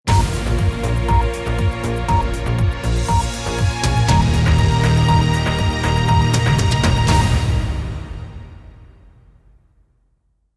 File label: Closing Sting